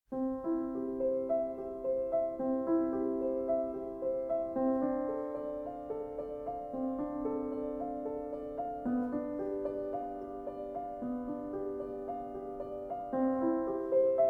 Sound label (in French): prelude en do majeur bach; classique cinema